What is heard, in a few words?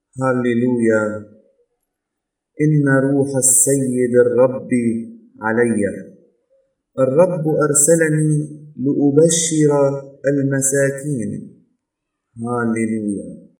Gospel Antiphon; Sunday Advent